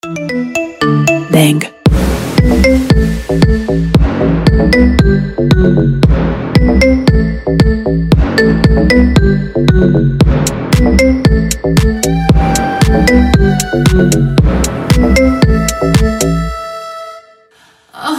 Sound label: rington marimba bang